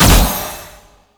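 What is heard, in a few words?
Kunai Projectile Explosion, Weapons; Warframe; Choir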